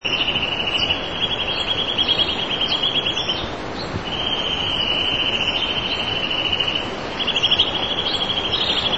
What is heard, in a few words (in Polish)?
kowaliki; wroblowe